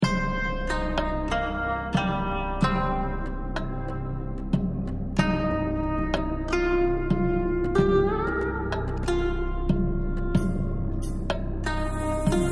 menu music; Samurai II: Vengeance; Android

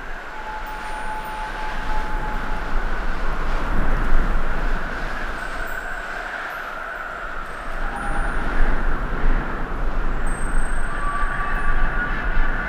amb clouds windy; music world

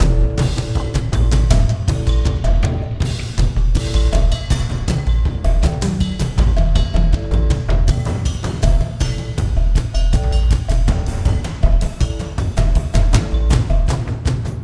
sounds music; Halo Combat Evolved; Xbox

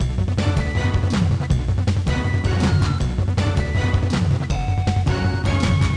Continue Music; breakers collection; ps4 ps5 switch windows xbox one xbox series xs

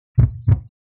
Heartbeats Once; Hightlevel

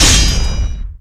Shutter Down; Etrian Odyssey III HD

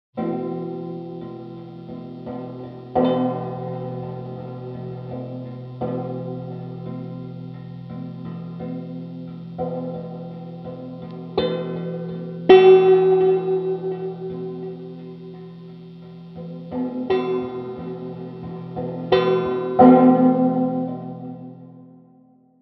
Plucked Guitar Explore Mood; gamerip; the last of us

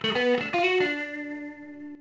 Stinger Great; spongebob vs the big one beach party cook off; nds

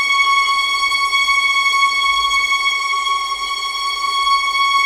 tremolo; ice age scrats nutty adventure; Level4 Hidden World

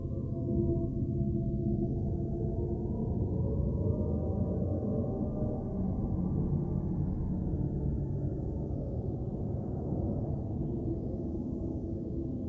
music - mystery - theatre ambient low short loop; You Are Empty

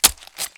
Knife Stab

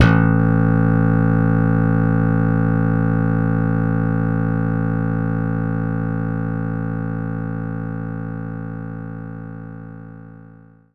Slap Bass A2F

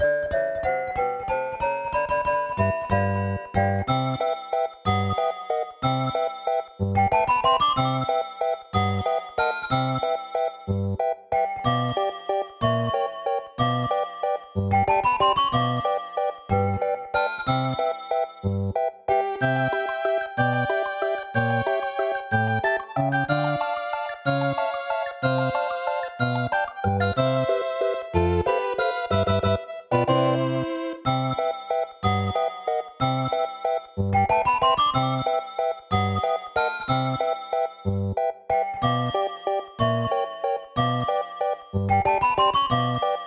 Underwater BGM SNES

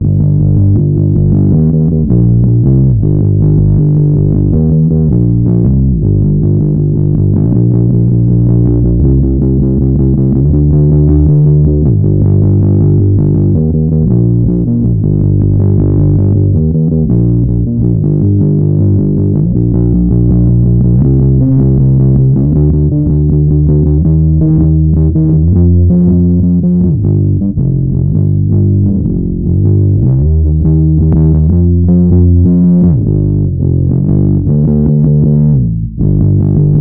Bass

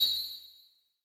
Collect star

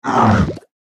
Fishmen Death; We Are the Dwarves

